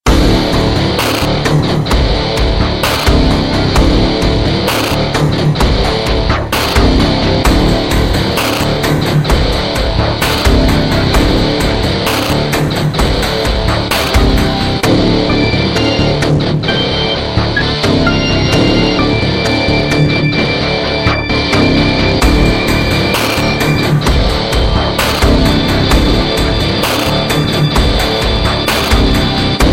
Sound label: Combat Music; DS9FX